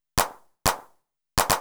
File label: CLAP DISCO 125; Drum